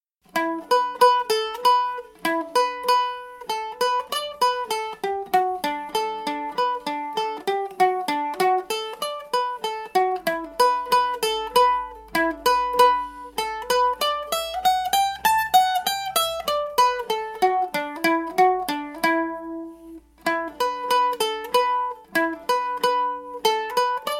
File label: Joe Cooley's reel slow, banjo